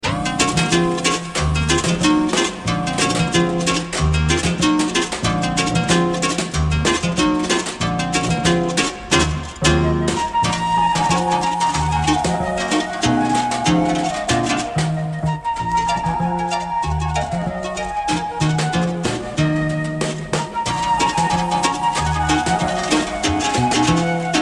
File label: Gavotte En Rondeau jazz goes baroque